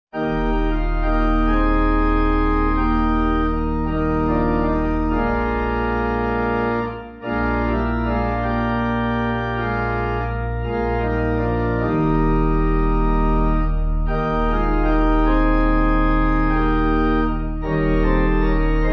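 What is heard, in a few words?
Pipe, Pipe Organ backing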